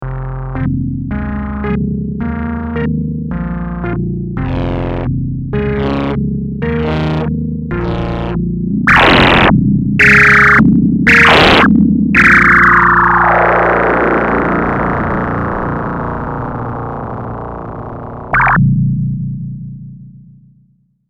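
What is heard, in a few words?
Filter Drive, synthesizer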